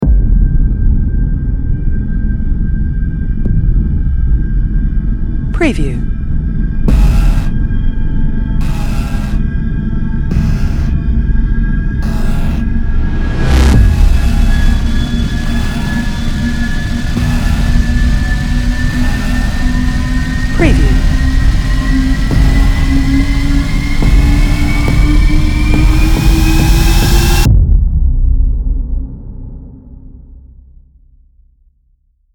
Mystery Thriller Tension Building Rising Cinematic Dark Horror Scary Trailer Intro